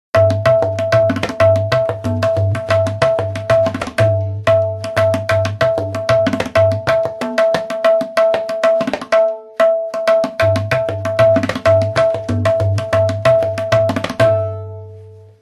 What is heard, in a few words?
Ring Tabla; Microsoft; Windows Mobile ringtones; Individual download-only (2008)